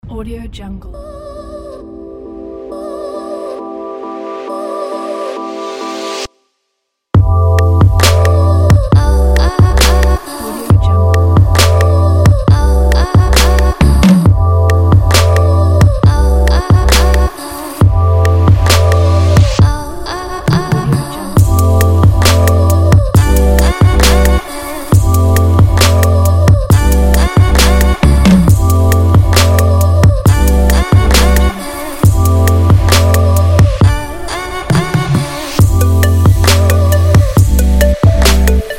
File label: lifestyle